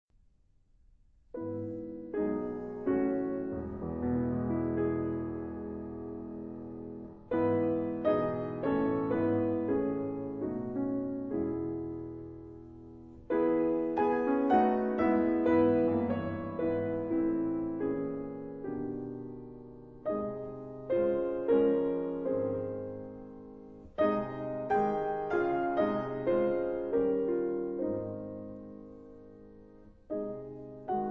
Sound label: piano Improvisation; Concertante